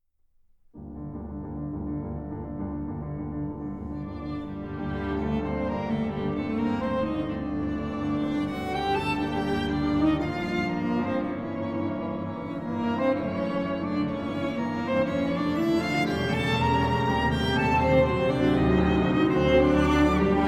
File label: Piano Quintet Allegro; Op.34; Kwintet fortepianowy